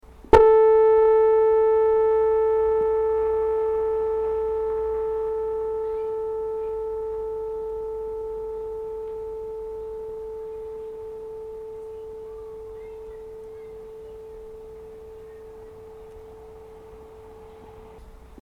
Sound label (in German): Kammerton A (440)